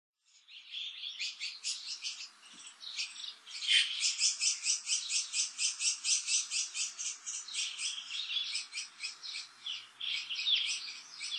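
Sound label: White-cheeked Starling; kokubunji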